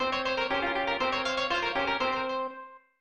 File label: Unused Jingle; Honkaku Shougi Fuuunji Ryuuou; SNES